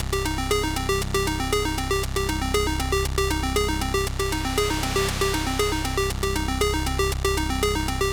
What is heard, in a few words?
loop 118